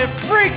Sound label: car horn